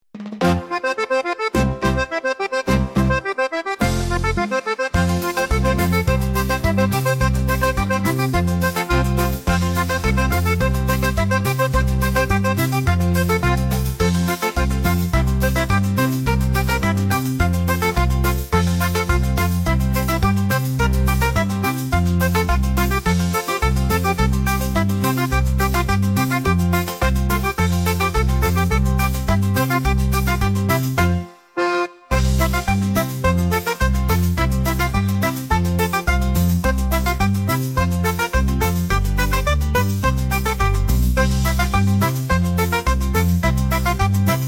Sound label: echoes of the savanna, afrikanskaya